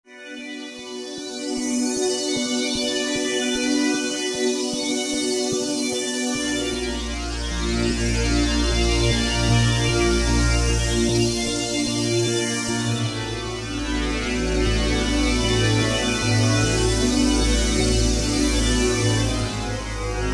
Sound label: Animotion, Roland SR-JV80-04 Vintage Synth